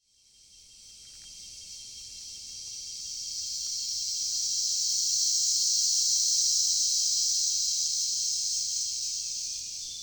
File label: Cicada Wave; Peter Marting